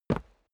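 step boots single concrete single stair up, footsteps; schritte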